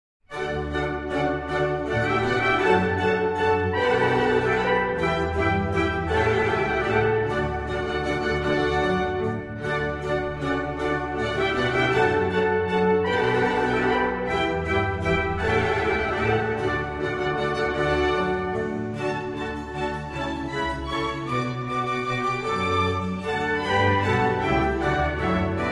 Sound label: Suite in D Major; Water Music; HWV 349 3. Minuet